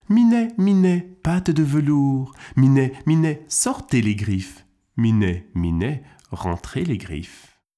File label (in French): Minet minet, jeux de doigts, kids